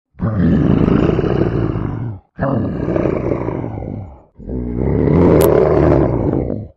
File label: growls